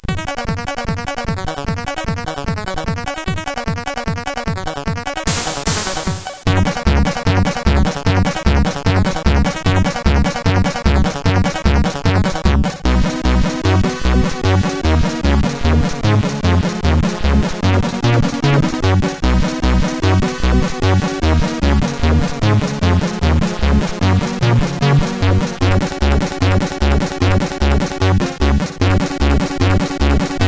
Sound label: Country, sid, CSG8580R5